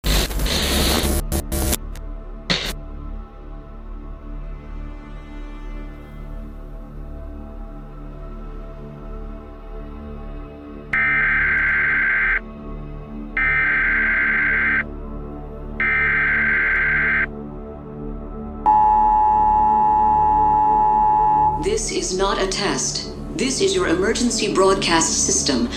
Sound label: Alert